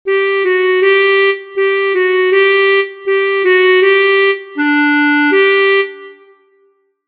Gavot acc a

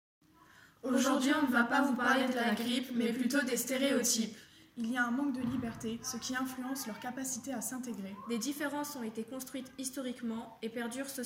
slam

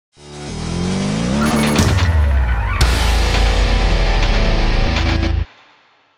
jingle latin

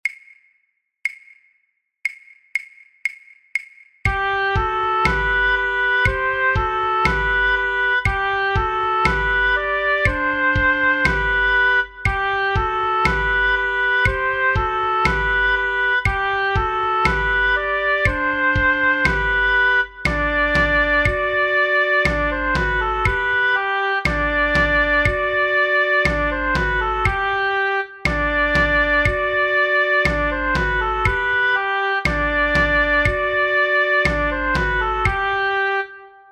Gavot percu 60

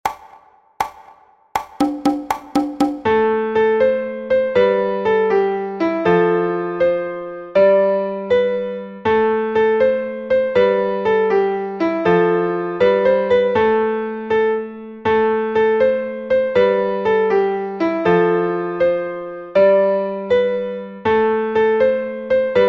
Goeland melodie

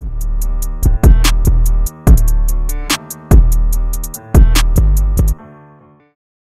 Virgule musicale; Radio Ulysse

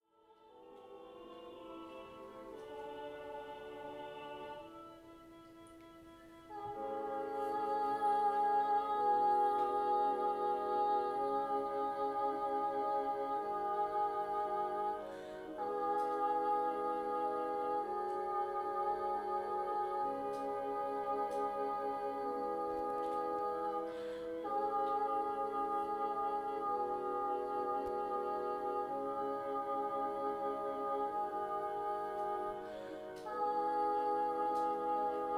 Intermede tenor

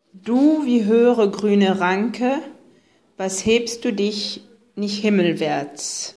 chorale

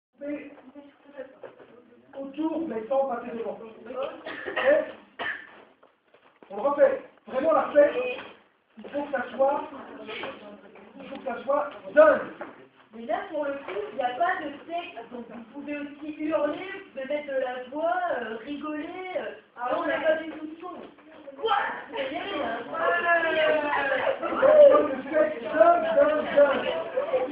Vocal

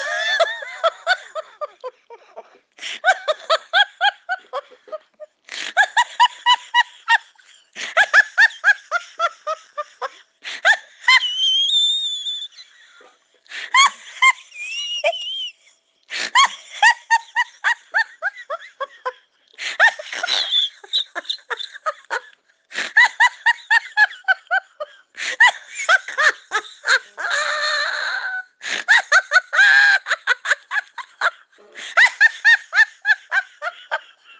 Le rire

